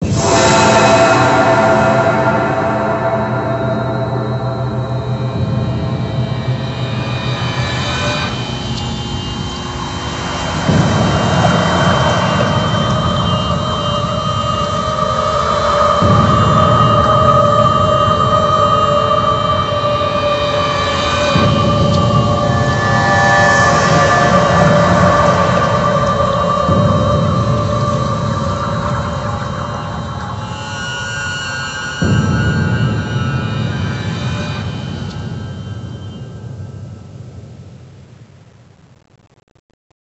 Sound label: Music Mission Intro; generator rex agent of providence 2011 nds gamerip; Abyssus